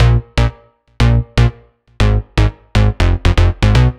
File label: 120 Synbass, Miami Bass; Zero-G; Soundclash Nu Dance Electro; Combi Loops; 120bpm; Miami Bass Machine